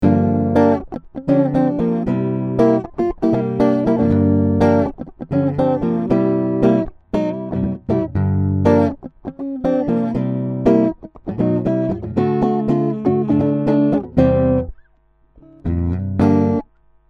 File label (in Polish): gitara orginal